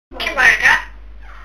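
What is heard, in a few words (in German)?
kim ball, Soundeffekte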